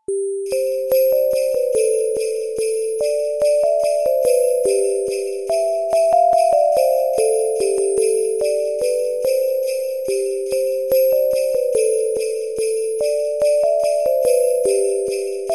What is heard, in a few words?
merry christmas short sms tone